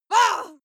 female lunatic shout short; schreie; menschen

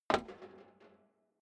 step boots metal rattle single stair down; Footsteps; schritte

